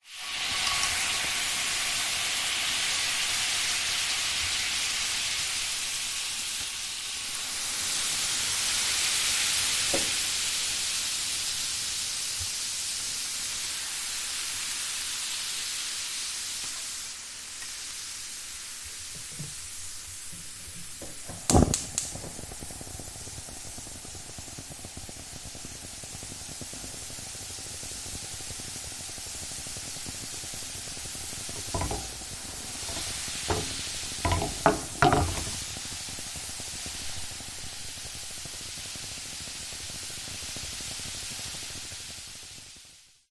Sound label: household pan oven hob oil mix water sizzle spit ignite pan movements; zapsplat